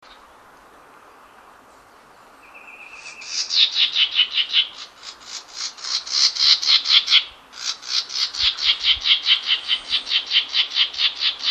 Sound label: Yellow-throated Vireo, Vireo flavifrons; vireos